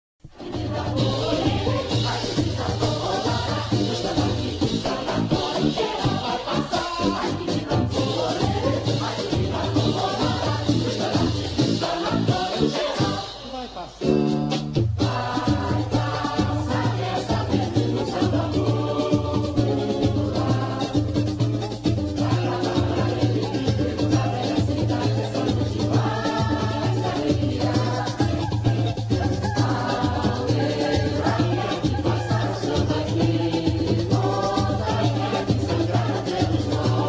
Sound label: samba